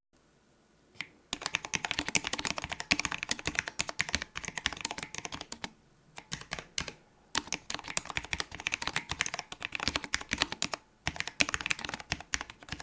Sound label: Cooler Master SK650